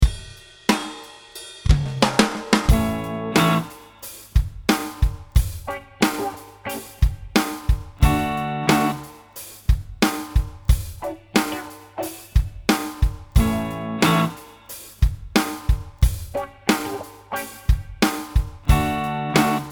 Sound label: Funk